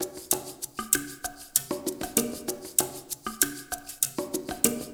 PERC MX; Spectrasonics; Liquid Grooves 1 & 2

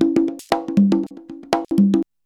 CONGA 119

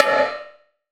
Perc, Gated Reverb; musicradar

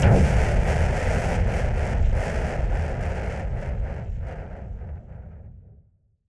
Noise Bomb Boom Filter; Noise Bombs; musicradar; bomb factory

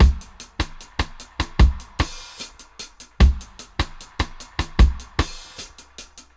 76 VRB, Dub, DRUM LOOPS, 076 BPM; Drumdrops In Dub VOL-1

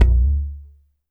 WD, DRM HITS; Spectrasonics; Liquid Grooves

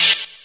HIT, HOUSE; WORS STATION

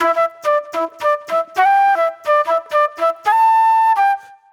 Agro Fusion Flute

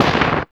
RADIO, 160 BPM; Ueberschall; Drum & Bass Flash Trackz

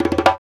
KAKID, African Perc; Spectrasonics; Supreme Beats; African Contemporary; Afro DRMs

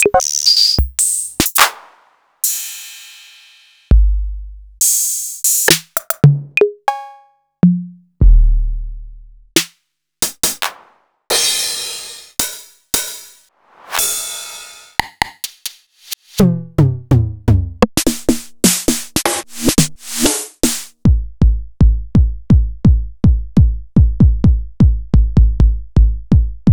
EMU Orbit

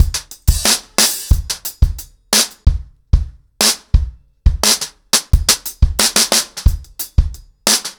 90BPM, acoustic drums, drumloops

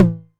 Tom Hi, Novation Drumstation; Novation DrumstationV1

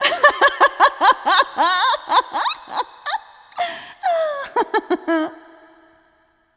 laugh

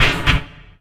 Oneshot; Sonic Foundry (Sony Creative Software); Esoterik Beatz; Warsaw

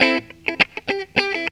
GTR, GTR RIFFS, Funky Elements; Zero-G

